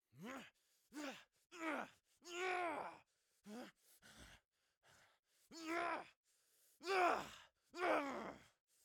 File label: Good Guy Grunts